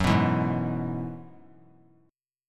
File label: F sharp 7th Suspended 2nd Suspended 4th; F#7sus2sus4 strummed